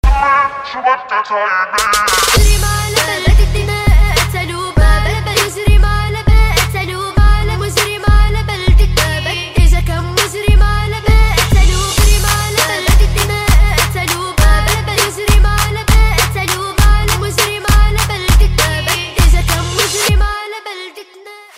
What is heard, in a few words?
Arabic Trap